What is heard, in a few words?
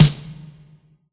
Yamaha TG100 Snare Drum